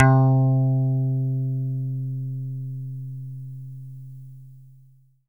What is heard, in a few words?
E-GITARRE, Alpine Volksmusik; Best Service